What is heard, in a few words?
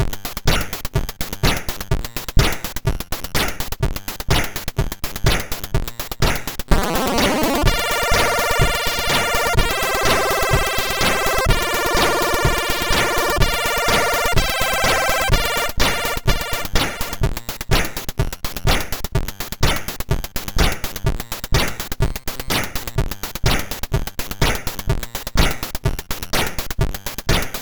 House; Svetlana Tovarisch